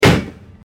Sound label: car To Car Crash; teststrecke-game